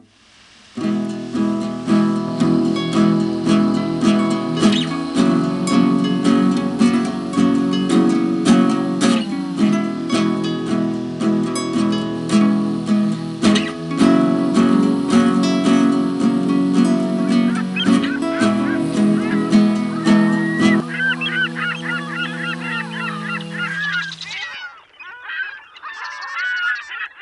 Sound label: hey seagulls